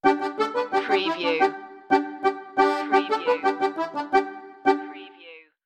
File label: scraping the roux stinger